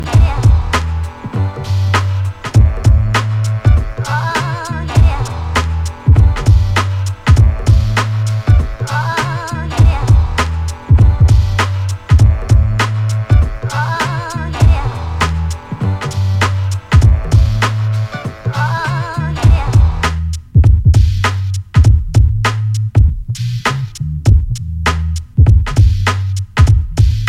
Instrumental

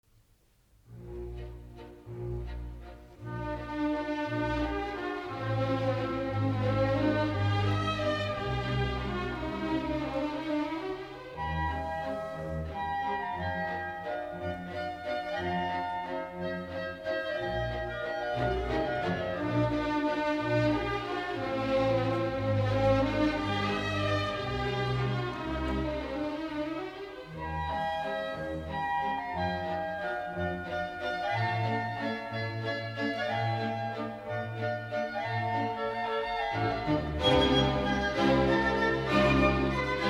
Tempo di valse

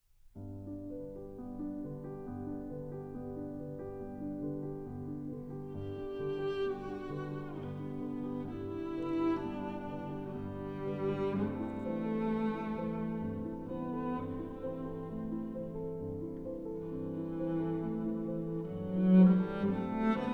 The Swan Arr for Cello and; Yo-Yo Ma; The Carnival of the Animals; Piano

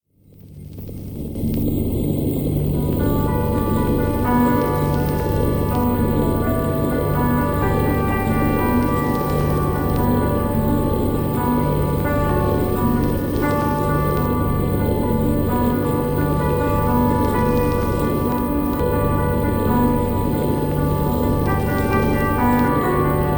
Piano

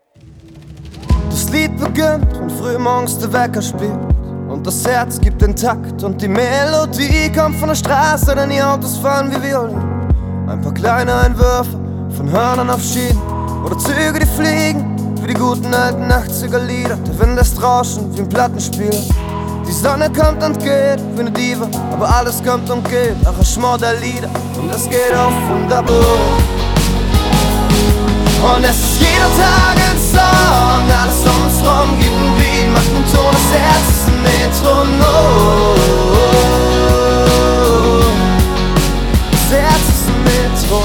Radio Version

